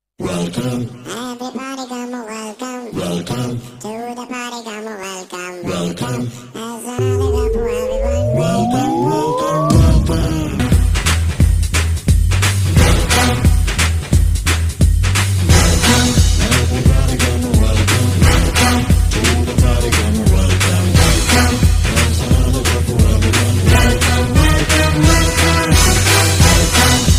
welcome theme ringtone funny ringtone instrumental ringtone bgm